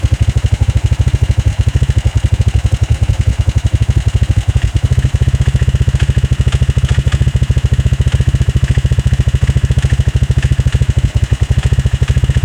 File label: motorbike stationary not